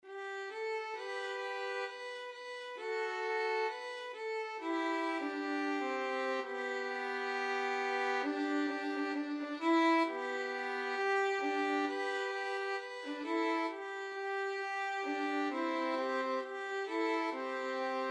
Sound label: this little light, violin duet